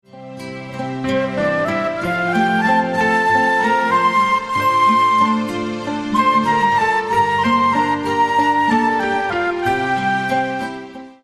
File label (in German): melodie